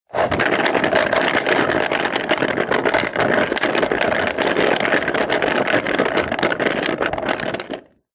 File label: industrial dolly trolley wood small on wheels fast roll on wood; zapsplat